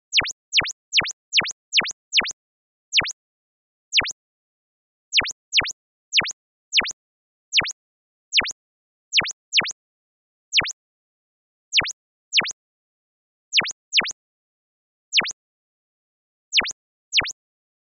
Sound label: Birdy; PM Flummi